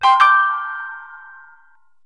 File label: Start Game; trivia crack